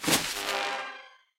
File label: Casket Enter; Deathrest Casket